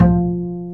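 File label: double BASS F-3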